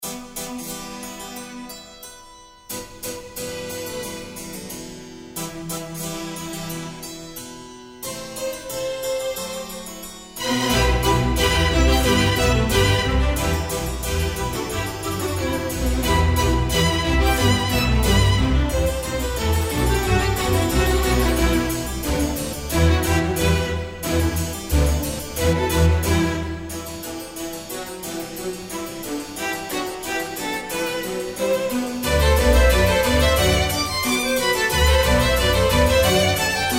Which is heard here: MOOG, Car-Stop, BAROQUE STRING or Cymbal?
BAROQUE STRING